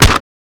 neck break

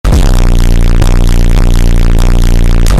Distortion Dab

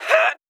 Grunt; EDDY; Ed Edd n Eddy - Adromulus Pack; EEnE

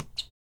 Shoe Squeak; Ed Edd n Eddy; Adromulus Pack

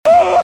"Ugh"

